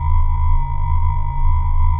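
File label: Ambience Sci-fi Energy Loop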